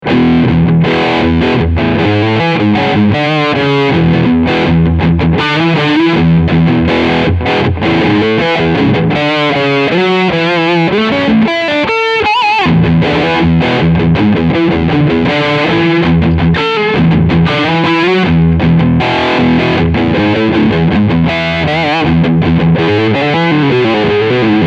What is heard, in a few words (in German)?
Steg HB Overdrive